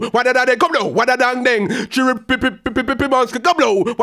ragga in B phrase

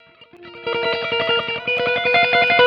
Guitar, Drum N Bass; Sonic Foundry (Sony Creative Software); Knutrix Drum & Bass

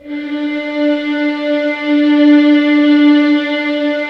violin D4, soft violins; Q Up Arts; Denny Jaeger Master Violin Library

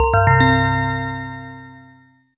BELL ARPS; Zero-G Ambient 1

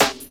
SD, Dance Mega Drums; Best Service; SD-HIP

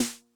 Snare High Tuning High Tone Mid Snap, 909; classic machines

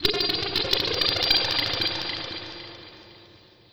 Space Skitterz Atmosphere, Lounge Atmospherez

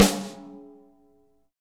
SN AMBIENT, Real Mega Drums

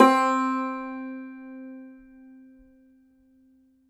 cumbus saz C, plucked strings; E-MU Producer Series Vol. 7 – Old World Instruments

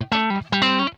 PLUK, Funk Guitar; 90 s Sample CDs; Zero G

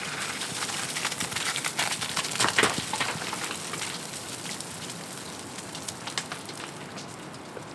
Rockfall, On The Glacier

